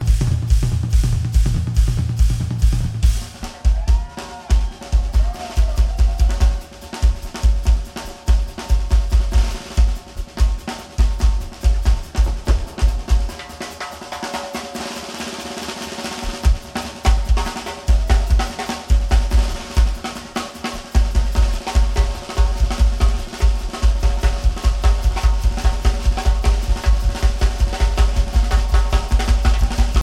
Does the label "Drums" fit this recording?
Yes